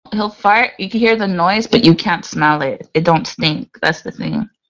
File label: fart